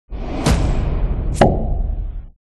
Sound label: Splash Logo; Divinity Dragon Commander; Larian